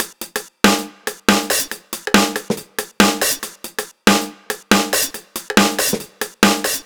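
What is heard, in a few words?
140 No Bass Drum, Drum Loops; Zero-G; Soundclash Nu Dance Electro; Acidized Wavs; 140bpm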